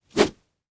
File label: Foley Wood Two By Four Whipping